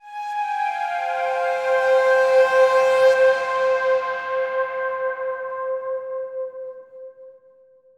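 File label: Synth F minor; Torchlight III; PS4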